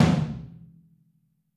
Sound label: Drums Percussion Kit1 Room Mic